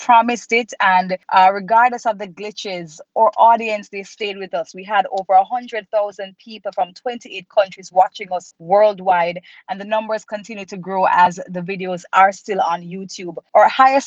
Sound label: REGGAE; SUNSPLASH